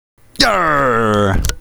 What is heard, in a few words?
arrrr